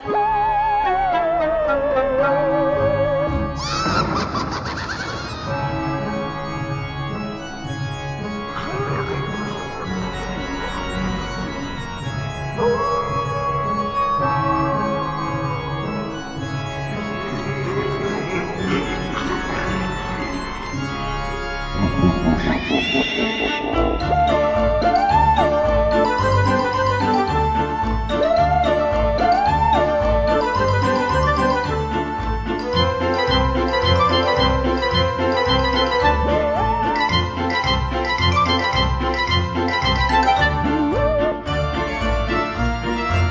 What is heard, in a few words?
Halloween Theme Song; Angry Birds